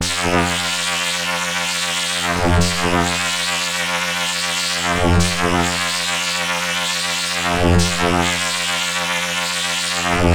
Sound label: Rude Stab; Sonic Foundry (Sony Creative Software); Underground UK House & Electro